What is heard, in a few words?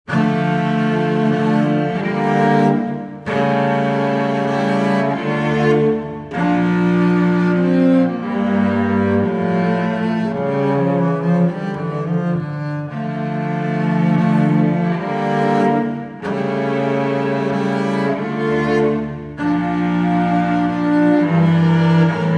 Chaconne in D minor, Cello; BWV 1004